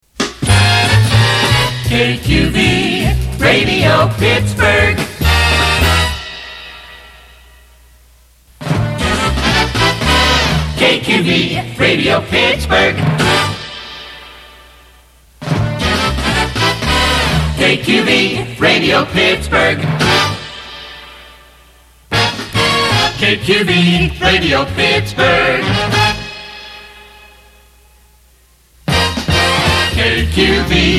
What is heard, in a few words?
keyed brass